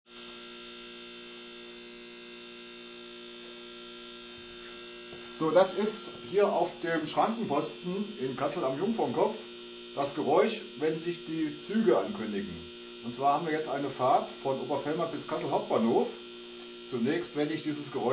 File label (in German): Schranken